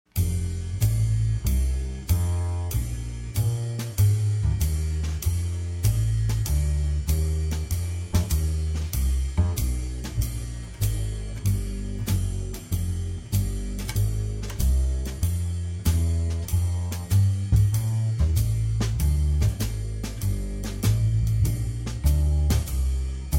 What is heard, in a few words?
Blues Bs Dr slow